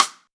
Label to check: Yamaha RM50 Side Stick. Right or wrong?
Right